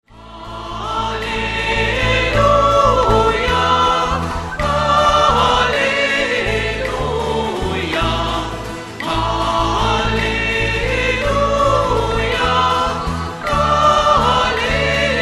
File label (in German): irisches halleluja